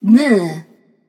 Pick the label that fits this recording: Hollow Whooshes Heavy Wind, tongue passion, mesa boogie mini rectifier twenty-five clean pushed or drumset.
tongue passion